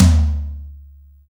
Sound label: ZOOMTOM LO, NATUR.TOM; Drums In Your FACE!